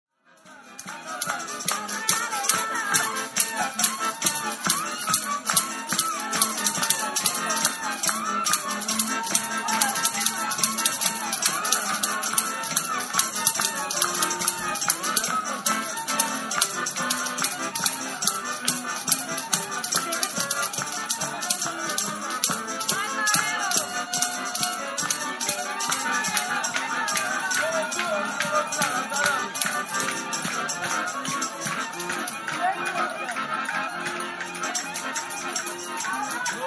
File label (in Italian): Festa Rom – Violini fisarmonica percussioni Romania; roma forestiera